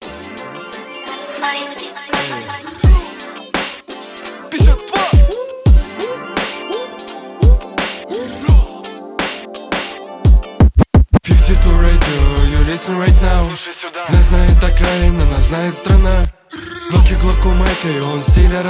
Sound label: g funk